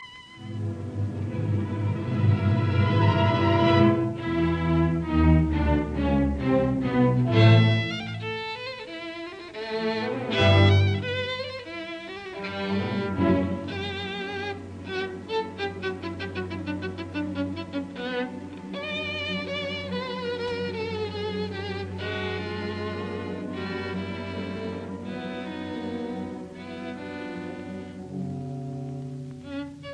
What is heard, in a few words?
Violin Concerto in B minor, Molto moderato e maestoso; Saint-Seans; Op 61